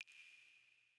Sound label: water drip light; Rust